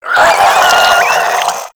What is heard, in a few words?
Infested Crawler Death; Warframe